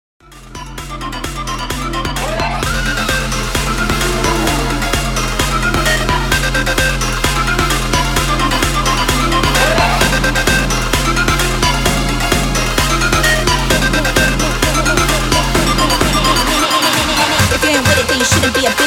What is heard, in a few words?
EDM BOOTLEG 130 BPM